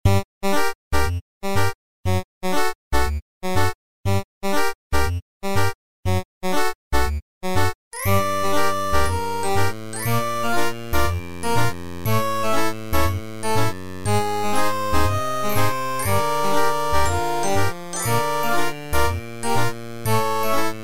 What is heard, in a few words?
house guest